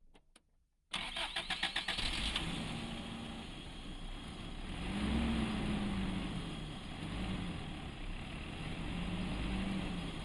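isuzu piazza onboard ramps start drive stop off engine right; Sonniss GDC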